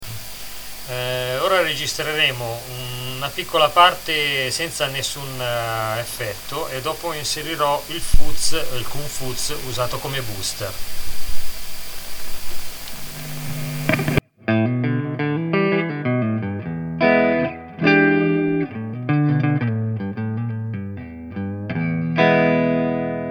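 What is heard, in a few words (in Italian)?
Kungfuzz leggero booster, guitar effect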